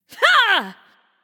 "yay"; smite; nuwa

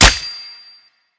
basic impact; smite; thanatos

